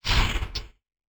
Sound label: BREATH